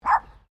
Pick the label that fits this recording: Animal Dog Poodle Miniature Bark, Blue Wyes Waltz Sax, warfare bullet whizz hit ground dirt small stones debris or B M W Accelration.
Animal Dog Poodle Miniature Bark